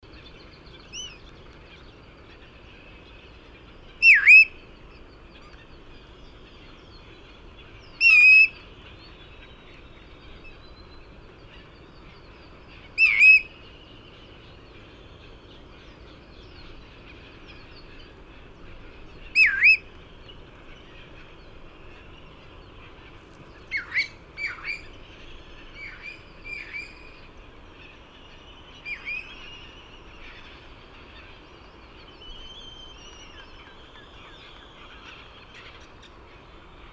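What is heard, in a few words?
bristle-thighed curlew voice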